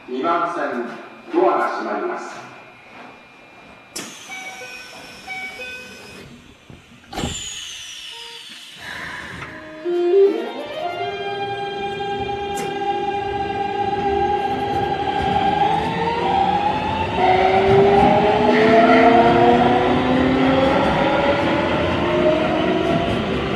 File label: keikyu 1000, siemens vvvf